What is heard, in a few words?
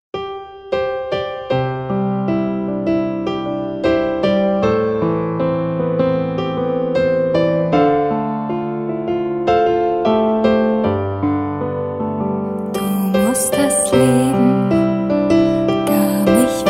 Piano